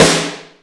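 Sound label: Roland R-8 rock snare; Power Drums U.S.A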